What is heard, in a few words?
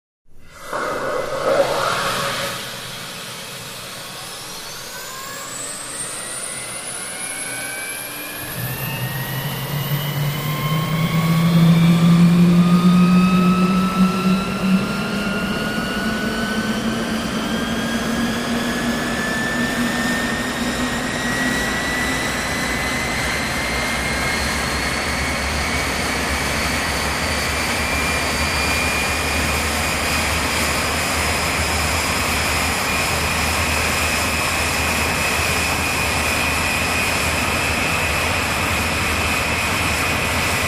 Gnat: Start / Idle; Flanging Start, Roar Builds And Subsides, Steady Idle. Close Perspective. Jet.